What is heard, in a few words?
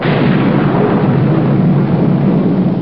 Thunder